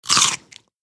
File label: Cucumber Crunches, CUCUMBER: INT: Bitin